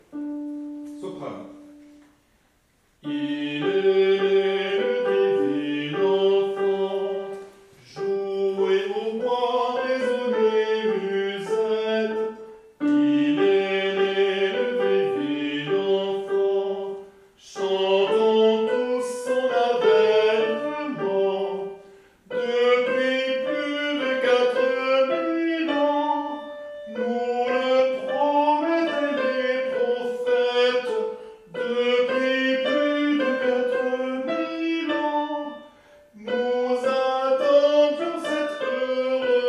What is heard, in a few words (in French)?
Il est né le divin enfant Soprani